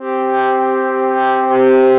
Pad Distant Spirit, Synth Pad

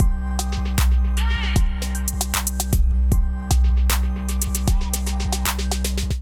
Miramar Kit Groove 77 bpm; MIDI Clips; Ableton; Factory Packs; Beat Tools

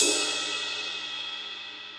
Ride Edge Classic Muffle; Drums; Drum Hits; Standard Hits; Ableton; Session Drums Studio

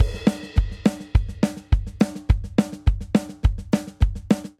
Country Verse 209 bpm, drum; 4 4; MIDI Clips; Ableton; Factory Packs; Drum Booth

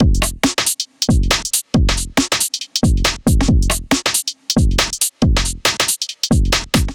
Kit 138 bpm, Drums; MIDI Clips; Freeside Kit; Ableton; Factory Packs; Skitter and Step

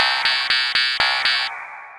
PolyRythm FM, Synth Rhythmic; Ableton; Glitch and Wash

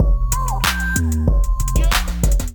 Digi Kit groove 94 bpm; MIDI Clips; Ableton; Factory Packs; Beat Tools

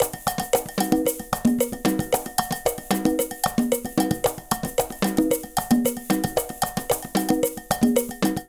Calypso B-113bpm, Latin Percussion; Ableton; Factory Packs

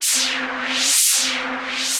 Ghost Rain; Effects; Ableton; Creative Extensions; Poli

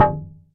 Woodlog Model, Percussive

